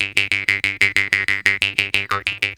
KHOMUS

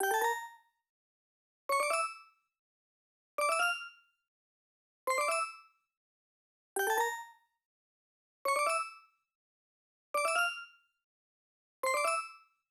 PWM Kit 142BPM Chorus - Bells DRY, Gmin; Vengeance - Essential Clubsounds Vol.5; Melody & Synth

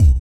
BOOGAROLL, Vocal Blues Soul

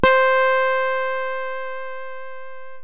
Synths BASS

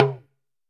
TALK DR, TALKING DRUM, Africa

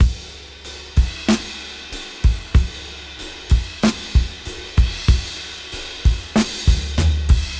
Half Time Slow 94 BPM; AMG - Keith LeBlanc Phat Butt Jams

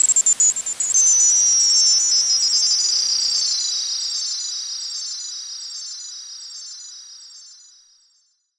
SH-09 Delay

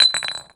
JAR CLINKER; Rarefaction; Poke in The Ear With A Sharp Stick

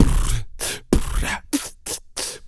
teeth clean; Spectrasonics; Planet

